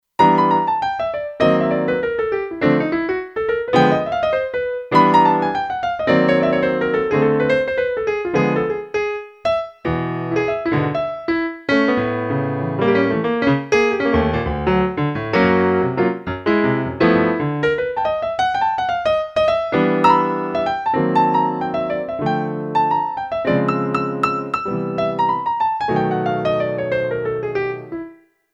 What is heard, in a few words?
Mono Studio Grand, Kurzweil K2500X; Factory Programs